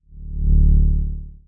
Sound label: pulse